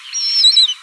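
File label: reed warbler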